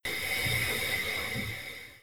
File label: Air High, Train; OpenBVE